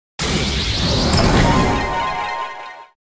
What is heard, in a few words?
Defeated Jingle; Hunter; Metroid Prime Hunters